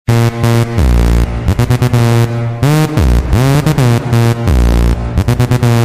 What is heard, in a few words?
I-phone Dark Message